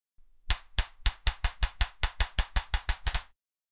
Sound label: short elastic gun